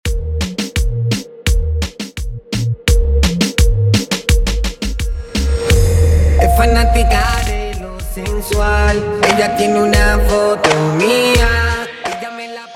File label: Intro Outro 85 BPM